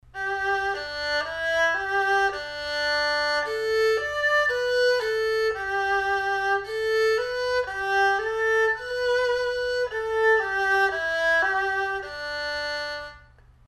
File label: erhu